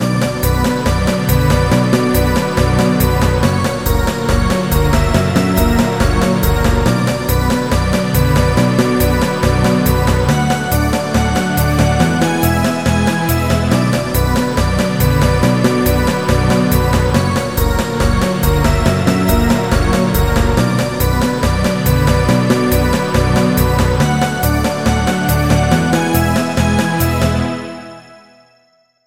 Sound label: Gameover; Sonic2; sonic epoch